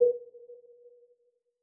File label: tv menu expand collapse